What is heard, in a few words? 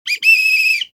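pipi whistle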